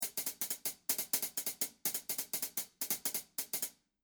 Closed Hats

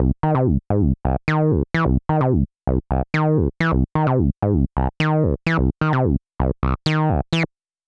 MOD EQ SAT

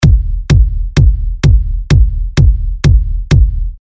All Kicks

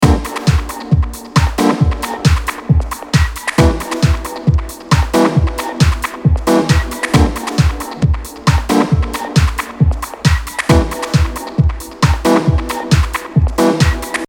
Dub Techno

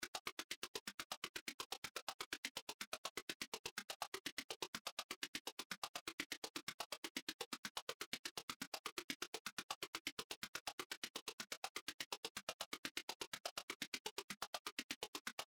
chorus

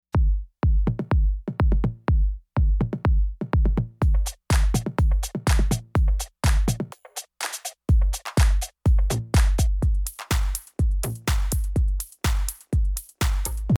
Drums Curve Bender Medium

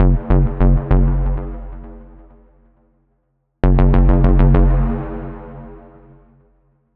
Ableton FX

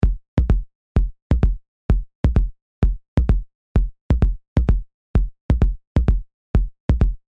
Kicks; Dexter

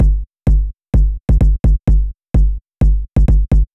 Kick Top End

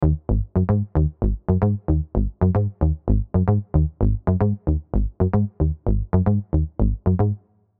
Bass Sequence

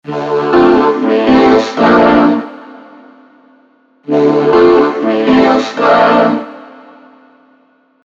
Vocoder; V+ Vocoder Example

